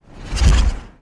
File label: Car Oncoming By Whoo